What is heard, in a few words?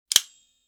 multi plier close; mechanical